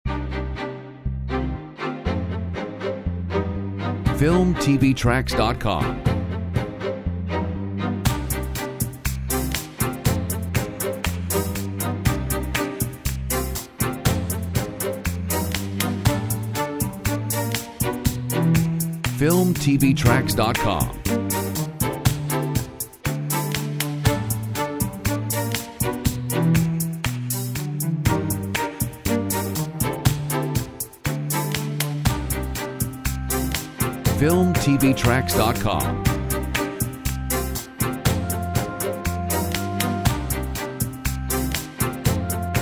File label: Corporate